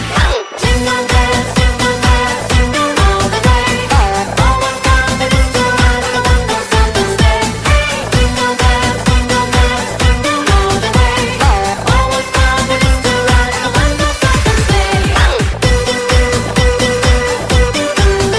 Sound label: Jingle Bell Remix